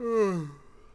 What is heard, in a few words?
Yawn Vox; Civ4 warlords